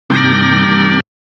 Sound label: bwooooooow